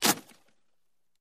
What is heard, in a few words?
Cardboard Tear; paper mvmt